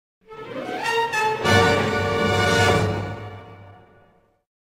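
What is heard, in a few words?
Sting Heavy; Family Guy Back to the Multiverse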